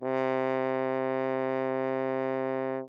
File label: Horn ff C3